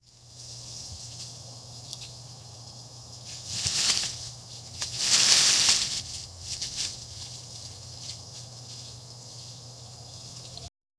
the soothing sound of leaves rustling in a gentle breeze